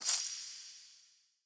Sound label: skill learn coin